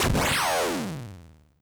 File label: beam rifle lowbit high powered